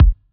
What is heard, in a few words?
Kick G; Aera; Leather